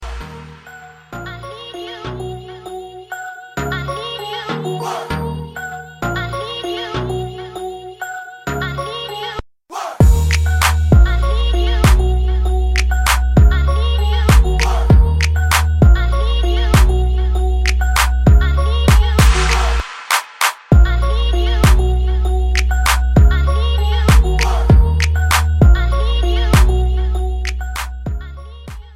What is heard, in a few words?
Ayo Ringtone